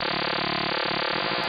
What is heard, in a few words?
laser; monkey2; PromptInvasion